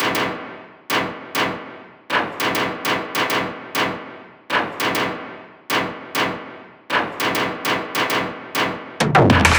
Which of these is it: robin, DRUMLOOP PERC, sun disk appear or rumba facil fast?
DRUMLOOP PERC